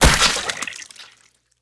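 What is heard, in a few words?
skill flesh explosion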